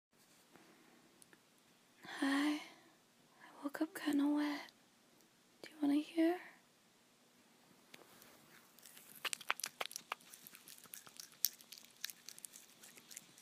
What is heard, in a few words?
Wet and soft moans